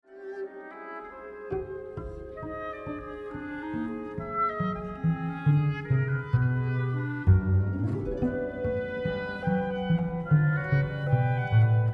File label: Concerto for doublebass winds percussion and harp